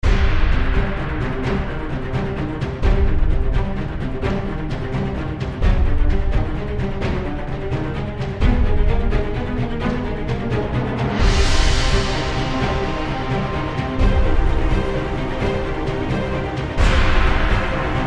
epic boss battle

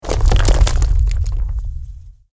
earthquake alt

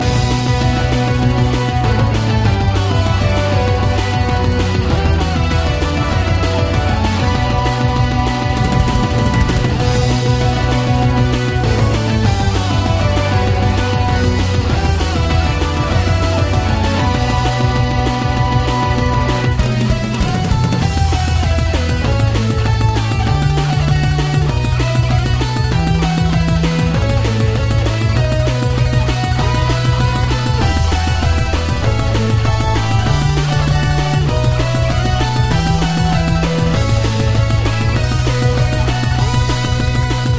Fighting Bots Fight Theme FAST up Whole Step loop; Mega Fighting Bots

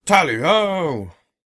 tally ho yell

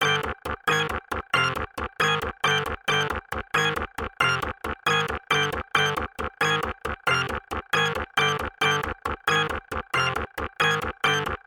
music boss theme

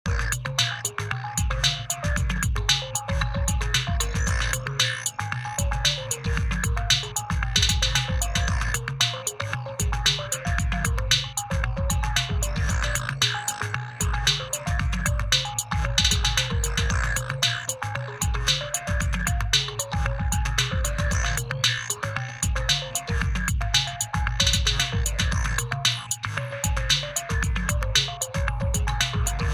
video strange loop

